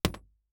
Weapon Bullet Shell 7mm Drop On Trunk; AdobeCC

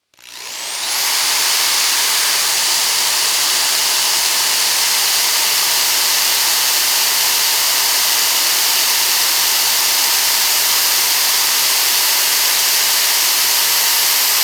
Industry Tool Drill Power Drill Fast Spinning

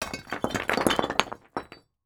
Impact Debris Rocks Falling On Cement

